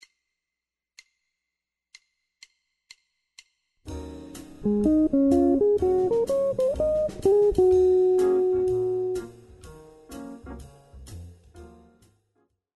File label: jazz guitar improvisation; practice routine